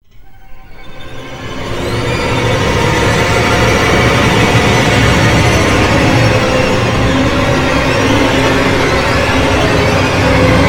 Violin Mashup

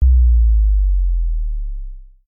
Boom

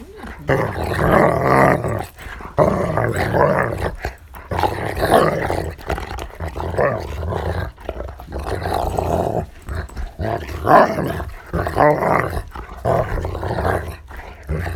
Dog Growls Barking

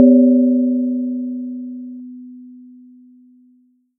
Traditional Asian Percussion

